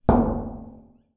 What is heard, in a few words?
impact metal empty drum large resonate